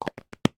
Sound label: household shaving gel can lid on